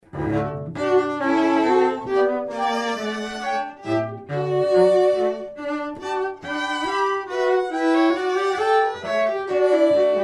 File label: trio; rontgen; allro spirit